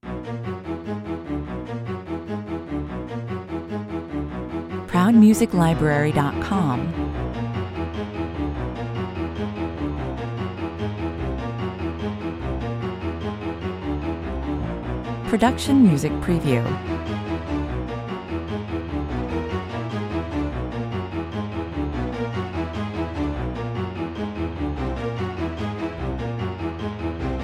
Frenzied, adrenaline inducing, frantic soundtrack with a maniacal repetitive pattern, 148 bpm; Frenzied, adrenaline inducing, frantic soundtrack with a maniacal repetitive pattern that creates an atmosphere of rapid, chaotic and disordered